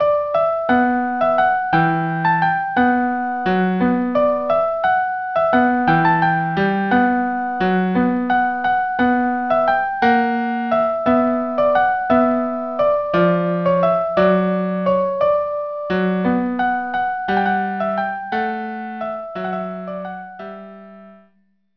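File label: violin cello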